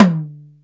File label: R-8 poptom, Dry